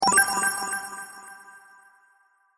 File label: Sparkle Idea, magic sound effects